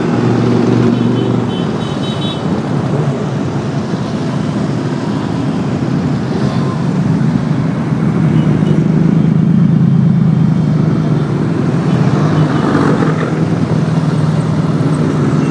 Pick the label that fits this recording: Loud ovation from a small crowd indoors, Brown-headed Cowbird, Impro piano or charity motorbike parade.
charity motorbike parade